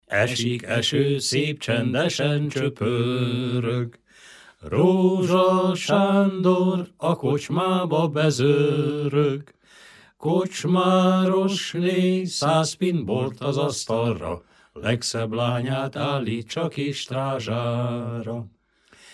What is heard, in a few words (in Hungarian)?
nepdal; esik eső